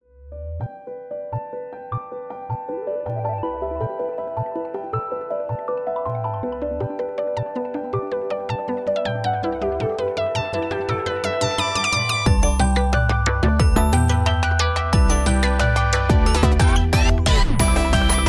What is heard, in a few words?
google pixel ringtone